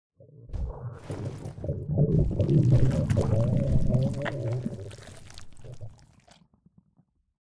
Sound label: struggle